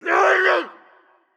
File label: hit